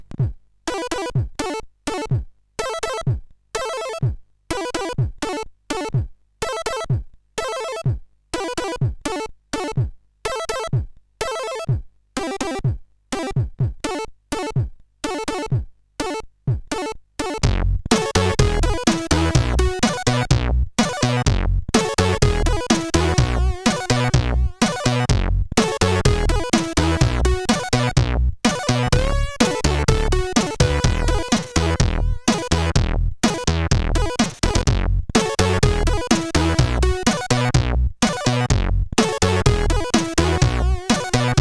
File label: remix, sid CSG8580R5